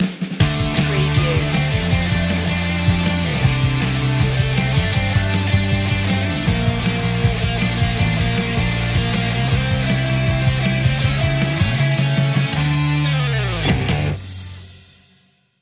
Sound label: turbo energy rock